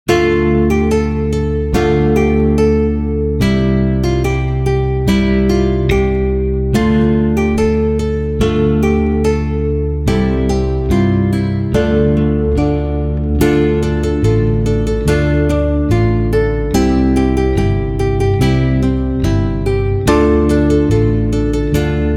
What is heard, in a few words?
72bpm; What shall we do with the drunken sailor